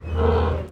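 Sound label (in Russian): кора морского льва на пирсе 39 Сан Франциско Калифорния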